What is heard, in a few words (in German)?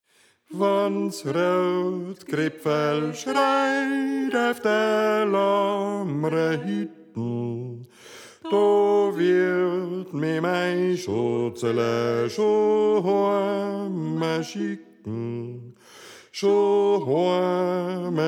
Bass; Wanns Routkröpferl Schreit